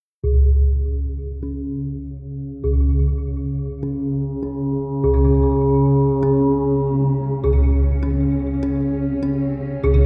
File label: om mani padme hung mantra